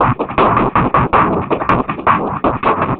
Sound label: Noise Beat; Drums & Drones